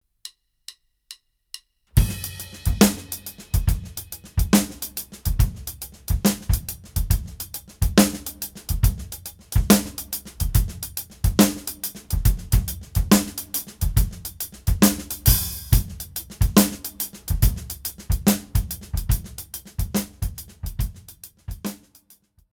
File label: Half Time Shuffle